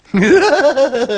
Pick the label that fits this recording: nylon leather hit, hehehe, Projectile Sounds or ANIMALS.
hehehe